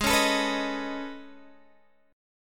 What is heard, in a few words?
A Major Sharp 11th, Ab